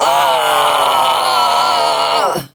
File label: Death, Barks; Kuva Lich; Persona C